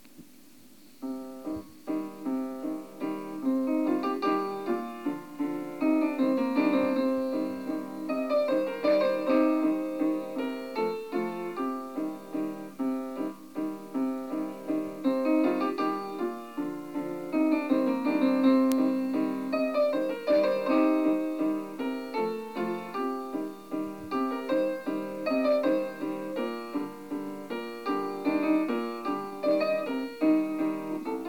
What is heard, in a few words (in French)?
Valse russe